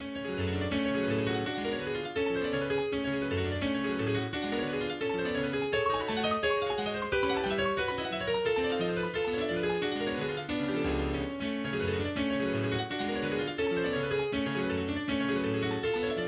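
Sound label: Prelude No 8 in F Sharp Minor, MIDI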